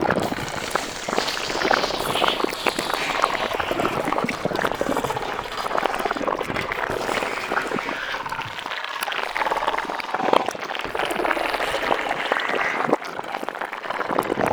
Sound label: Infested Pulsing Blob Small Loop; Warframe; Ambience; Nineteen Ninety Nine